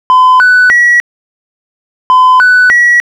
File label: tones